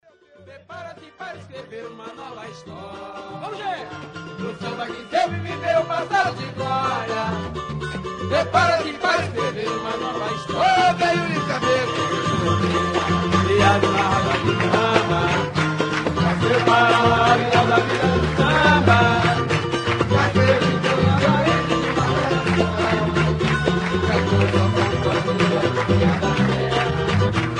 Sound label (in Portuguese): samba